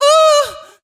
"ooh" SUNG

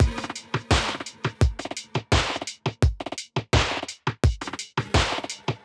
Drum loops, Electro

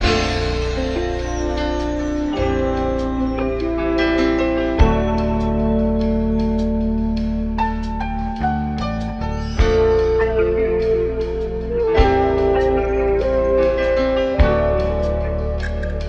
piano, Phonky loops

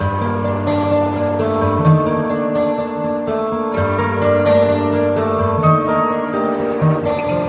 guitar, Sad

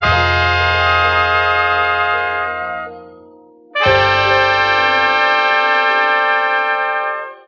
horns blustery stabs; Loops; Phonky

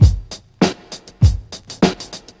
off kilter, Boombap Drum loops

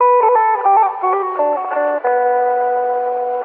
guitar imandnihm; Loops; Phonky

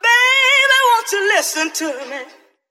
baby won't you listen to me SUNG

